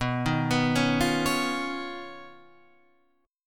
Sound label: Eb 7th Suspended 2nd Sharp 5th, B